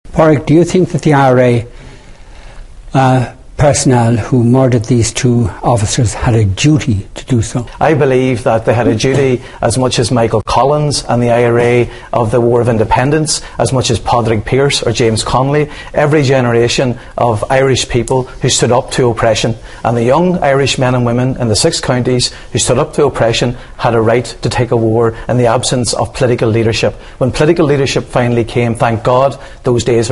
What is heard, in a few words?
pad